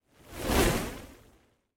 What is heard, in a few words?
fire thrown; project hawk